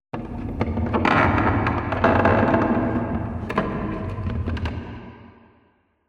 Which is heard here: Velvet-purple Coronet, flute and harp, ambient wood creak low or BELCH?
ambient wood creak low